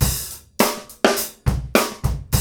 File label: GROOVE